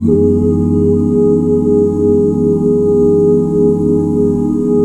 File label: CHORDS, Vocal Planet, Jazz; ILIO; Jazz & FX; OOO CHORDS